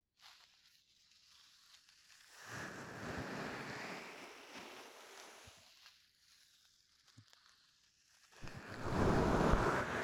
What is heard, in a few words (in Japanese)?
両耳同時耳かき ASMR; 30分耐久♪両耳同時耳かきASMR